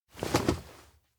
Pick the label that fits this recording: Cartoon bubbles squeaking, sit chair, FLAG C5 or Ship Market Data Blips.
sit chair